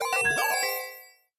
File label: UI Premium Spend; Taps to Riches; Android